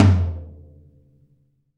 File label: TOM TOM, Real